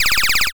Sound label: rewind